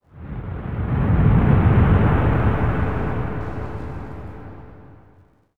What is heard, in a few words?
city occasional